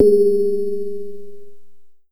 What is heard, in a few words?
Bell Singing Bowl Strike; Nexus6